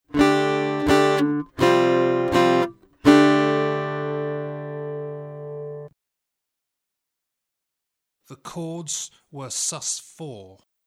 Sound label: Aural Harmony Test, acoustic guitar; Grade 3